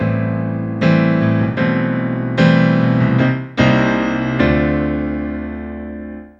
Piano Jazz

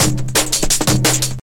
Jungle Roller

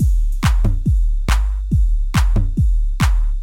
Hard House n Bass

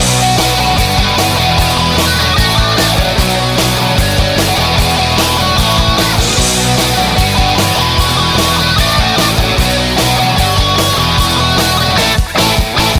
Grunge Guitar

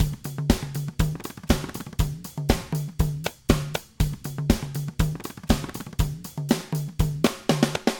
Ludwig Drum

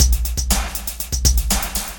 Loop Music 85bpm

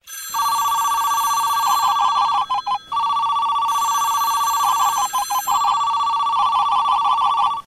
Phones Ringing

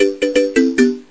Happy Marimba

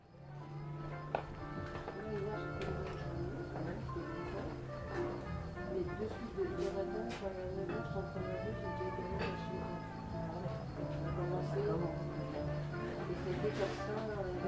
Jazz Atmosphere

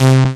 Bass Lick Hit

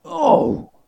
Grunt Pain

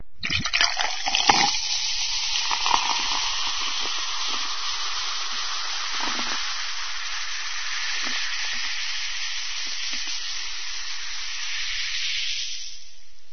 Pouring Soda Sound

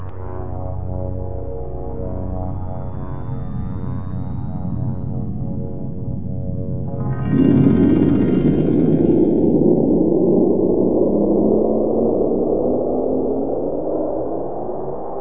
Intergalactic Pad

